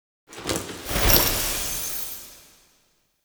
Bow; Tau; Somatic Bearer

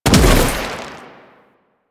Inf Arm Cannon Shot A; Warframe; Weapons; Infested

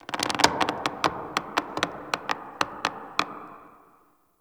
Root Cave Root Creak; Ambience; One Offs; Neathuns Root Cave

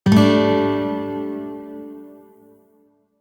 Shawzin Pent Maj Chord J; Music; DE; Warframe

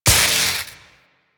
Vial Shock Shatter; elements; Warframe; Warframes; Alchemist

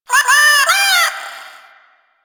Cat Loot Crate Meow; CatLootCrateCommon

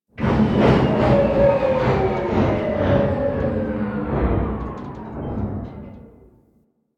Crew Ship Decelerate, Engines; Warframe; Vehicles; RailJack; Crew Ships; Grineer Crew Ship

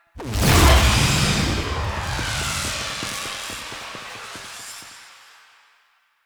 Run; Volt; Warframe; Nineteen Ninety Nine; Quests; Motorcycle; Mall Intro

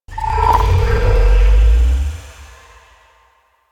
Lights Power Up; Warframe; Sacrifice; Liset

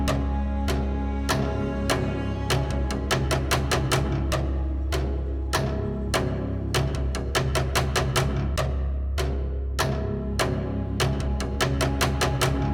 Round Three Loop, Music, Player Instrument; Arbinn Hills; Deora

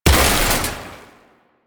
Core Electrical Explosion; Warframe; Ambience; Corpus Gas City; Gameplay Remaster

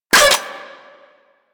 Scope Gun Mech; Crp Scope Gun

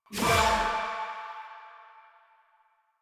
Choir Erupt Proj Throw; Warframe

